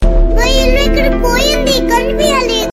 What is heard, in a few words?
sms tone ringtone; krishna